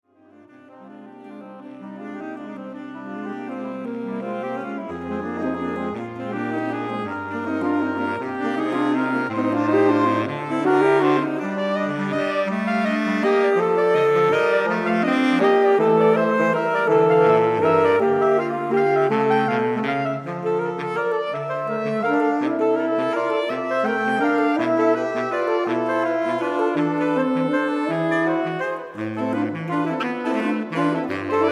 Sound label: quartetto, sax quartet